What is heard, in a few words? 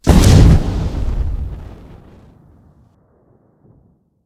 shout fire breath